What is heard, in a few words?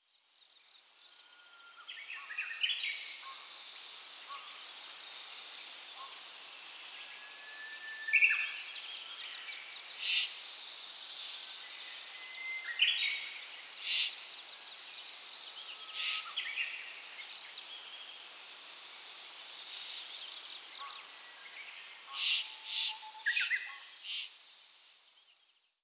bird